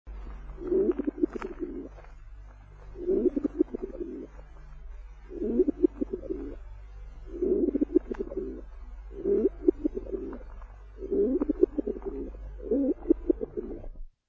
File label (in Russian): golubi